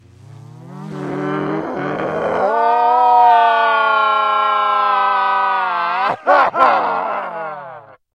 Scream

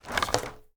Skill1 Start Foley; Rebel

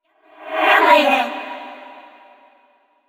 VO cheering; yamiko

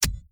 battery max; devour

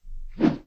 wooosh; Temple Run

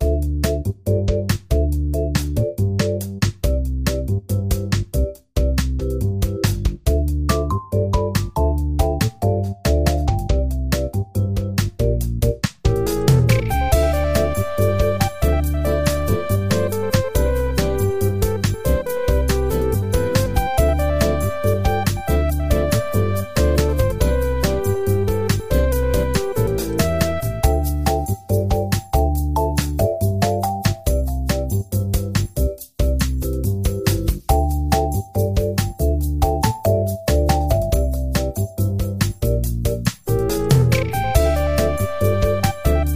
Tea Time Piano; pangya fantasy golf pc

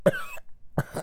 Cough

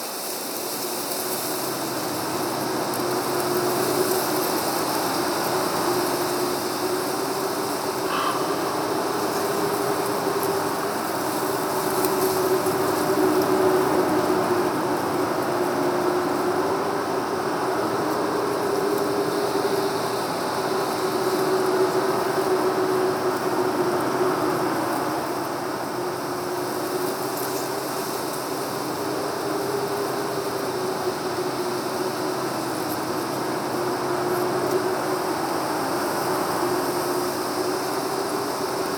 ambience default

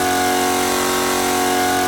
Mercedes-Benz Slr Mclaren High; car simulator 2

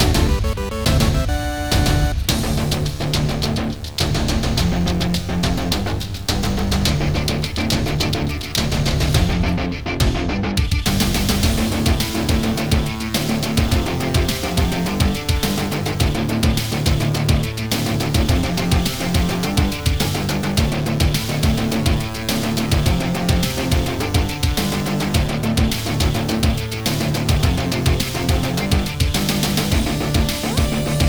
Victory Stinger

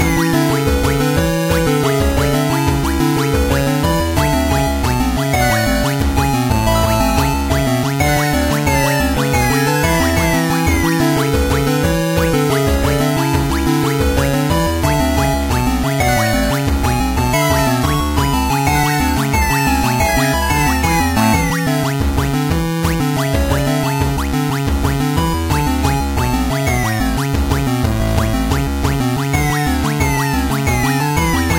8bit square; Hina-chan Maize Tower; Toho RPG; android

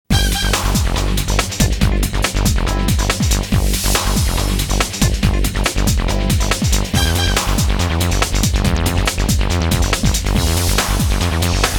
clear result; Teenage Mutant Ninja Turtles 2 Battle Nexus; PS2

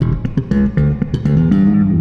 Bass wet; Nimbus Station; lego universe macos windows gamerip 2010

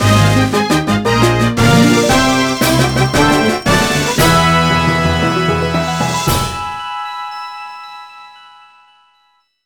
Ranking Fanfare; Nakayoshi All Stars Mezase Gakuen Idol; NDS

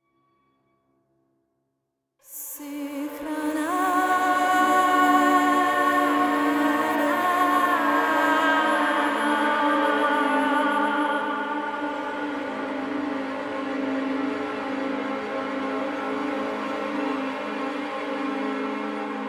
Monster Hunting; Skellige

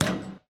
Ball Miss Rim Hit; Doodle Jump 2

